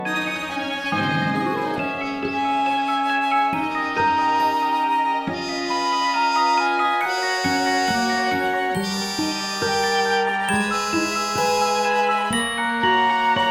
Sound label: backing track